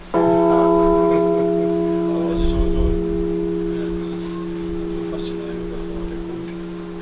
osterode bronze, Glocke Bronze; Marienkirche

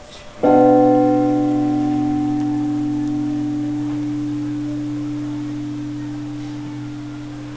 osterode stahl gross, Glocke 1 Stahl; Osteroder Marktkirche; Marienkirche

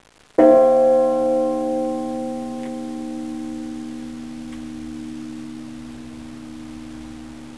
clausthal grosse, Glocke 1 Bronze; Clausthaler Marktkirche; Marienkirche

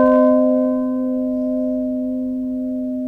Glocke 01, Glockenspiel; Glockenspiel der Technischen Universität

